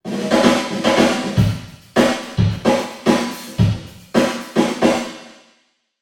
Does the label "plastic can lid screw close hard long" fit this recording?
No